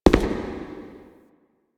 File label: Shadow Figure Steps; Warframe; The New War; Zariman Shadow